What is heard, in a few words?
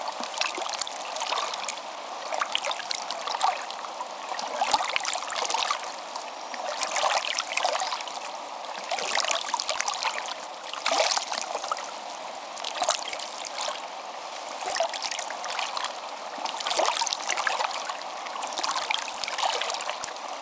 environment water gupp